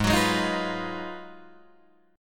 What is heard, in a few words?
Ab Minor 13th, Ab, Guitar, Drop D